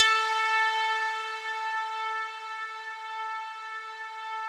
RESMET2 A4, Yamaha VP-1, RESO METAL 2; Music Store; 90's Sample CDs

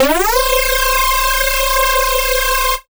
Synth Sounds; neuro; Stanza

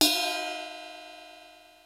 HATS CYMBALS; Kid Nepro; Elite

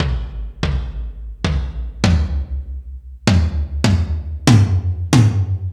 Sluggo Drums, Drum Machines

